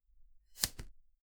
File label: putting the carrot in the